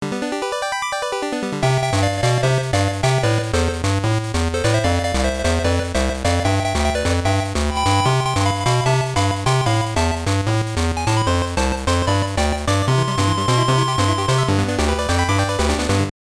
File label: Congratulations!; Game & Watch Gallery; GB